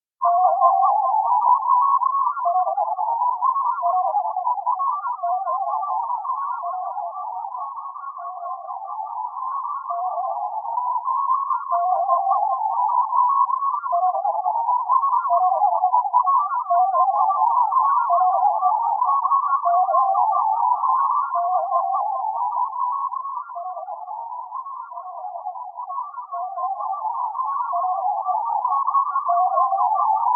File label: shuttle tones; background; TOS